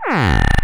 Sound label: Laser